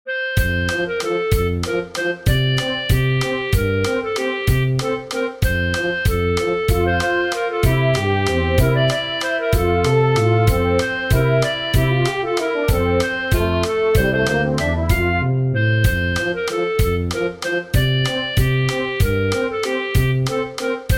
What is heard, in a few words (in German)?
Zwiefacher; Bayerischer Bauer